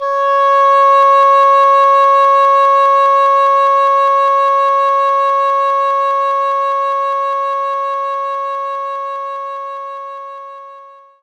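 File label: English Horn C#4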